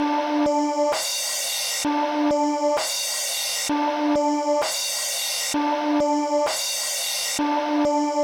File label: kawai k1 OMNIBUS LOOP; wavetables